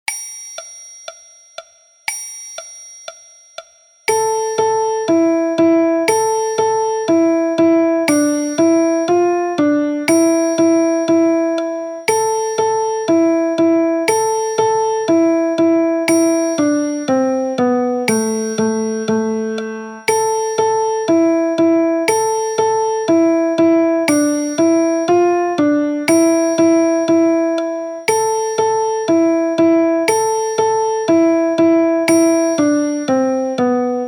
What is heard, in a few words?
Piano Version